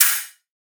drum hat fresh splash; Virtual Riot; Drum Hits

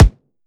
kick puncher

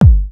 hard bass drumz

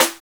BLUE SNR; Drums In Your FACE!

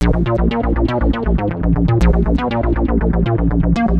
A#1, Synth Line; 90 s Sample CDs; NiceBeats; SynthLine VOL-18

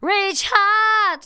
VOCALS SUNG; Techno Trance Essentials

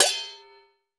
Kopyak Open; Cymbals

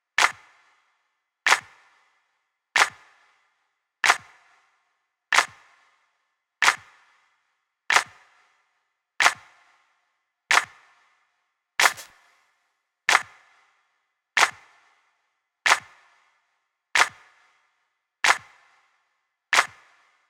clap, Drum Tracks, Smooth RnB

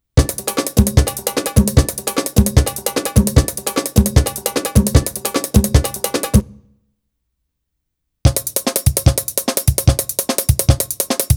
Arabic Rhythms

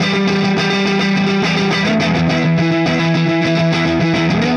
Clinical Distorted Funk Guitar

